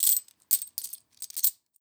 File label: tab economy; europa universalis iv